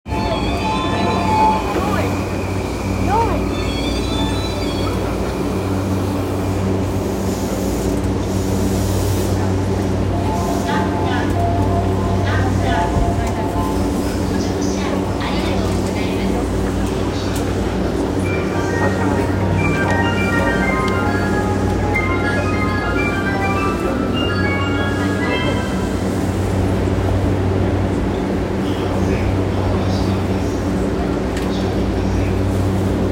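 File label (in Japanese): 山手線五反田 clockwise + counterclockwise, train sounds; JR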